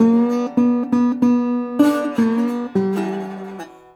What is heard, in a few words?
Blues Guitar Samples; ILIO - Fretworks